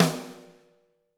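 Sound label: snare drum, Drums; Bob Clearmountain Drums I; SD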